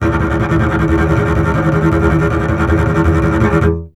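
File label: string contrabass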